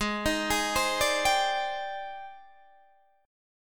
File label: G sharp Major Sharp 11th, Guitar Standard; G#M#11 arpeggiated